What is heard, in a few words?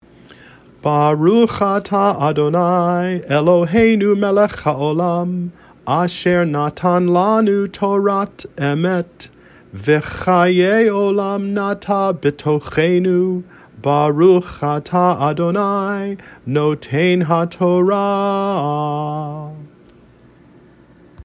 Closing Torah Blessing; Prayers and Blessings